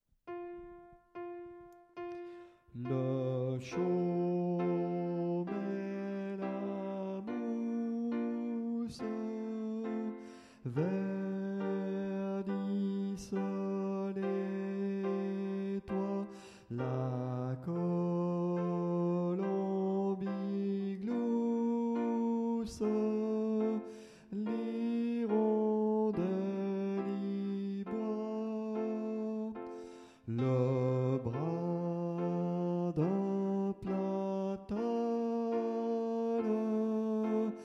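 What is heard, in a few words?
tenor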